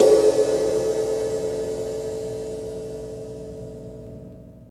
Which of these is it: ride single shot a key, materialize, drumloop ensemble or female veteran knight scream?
ride single shot a key